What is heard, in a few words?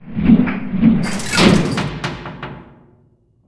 Kart Skill